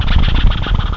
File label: spit; Isaac Asimov's The Ultimate Robot (1994)(Microsoft Home)